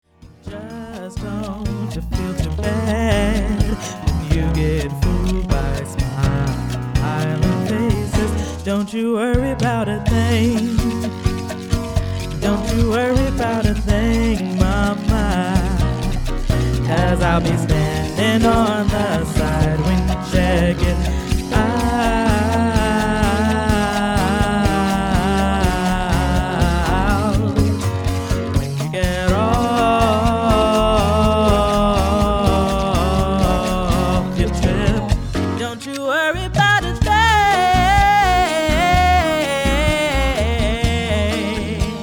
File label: 8 Piece Dance Band, jazz band